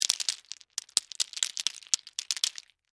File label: Debris Mixed Nuts, Pile; Texture; Materials; BlastWave